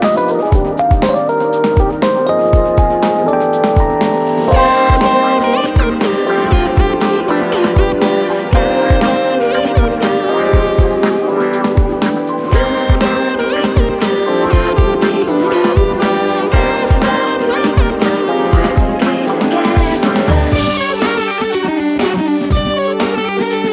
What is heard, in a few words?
Fast Life no bass